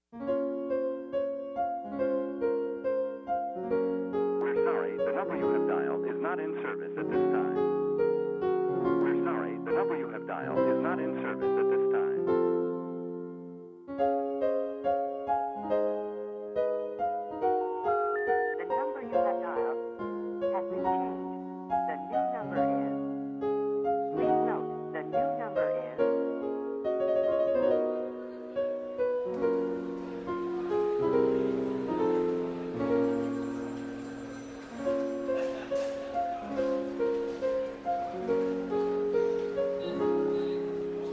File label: bgm piano